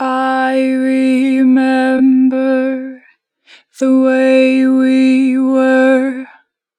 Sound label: Natural Vibrato, Vocals, I remember, 4 bar vib; Harmor Vocals